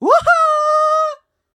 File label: into ceiling; Collector